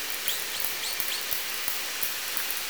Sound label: call S10 (L pod possible); Sea Sounds